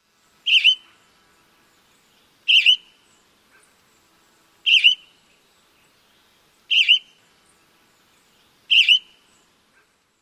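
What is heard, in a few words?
western tanager immature calls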